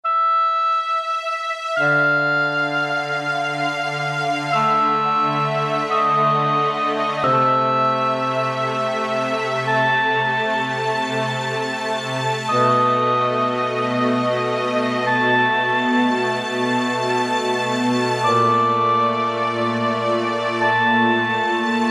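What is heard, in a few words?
ensemble orchestra; E-mu Proteus FX; Factory Presets; PeaceOrc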